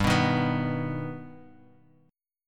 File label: G Augmented; G+ strummed; 15,14,13,16; JGuitar